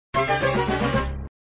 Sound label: Sound Down tune